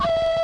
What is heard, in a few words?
klaxon, Train; OpenBVE